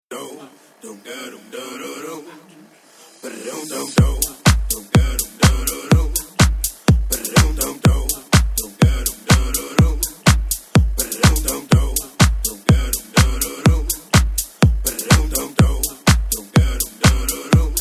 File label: Remix